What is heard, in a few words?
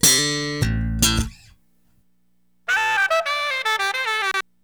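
BASS SLAP; E-MU Formula 4000 Series Vol. 1; Hip Hop Nation; Stereo Guitars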